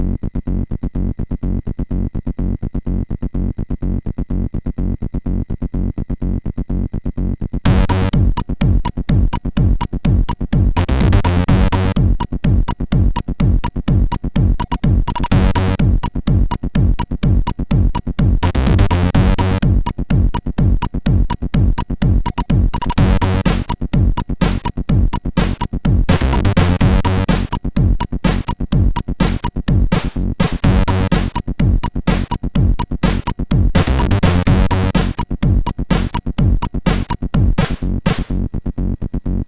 Loading sid MOS6581; hvsc

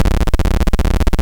CSG8580R5, GAMES; Street Rod

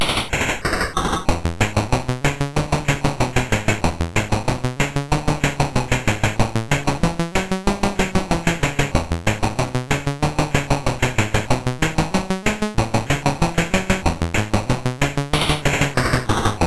sid MOS6581R3, GAMES; Foto Fitter

